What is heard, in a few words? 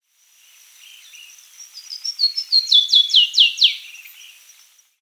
Basileuterus flaveolus song; Surubi-i